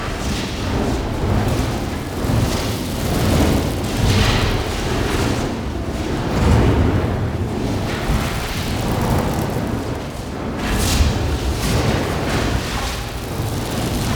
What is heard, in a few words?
Flamethrower Loop; Warframe; Nokko Colony; Visions